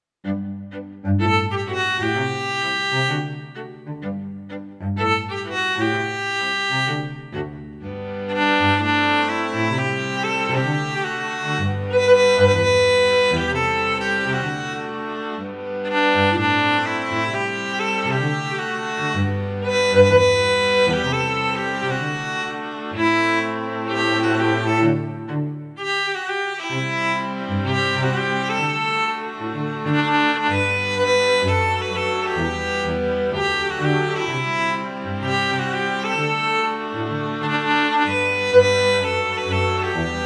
gemeinsam lachen macht uns spass strings

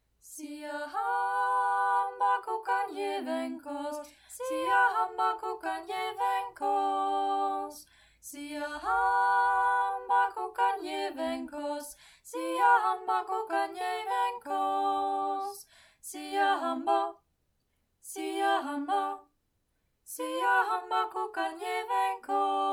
siyahamba zweistimmig

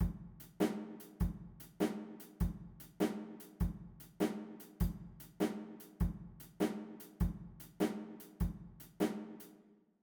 Schlagzeug; Liederschatz

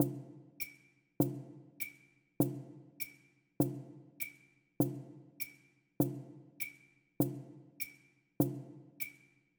Liederschatz Perkussion

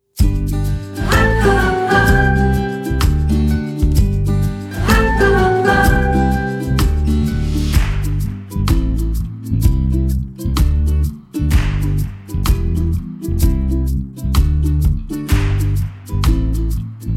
gemeinsam lachen macht uns spass pb nomel

